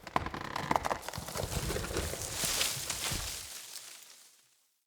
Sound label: Vine Door Open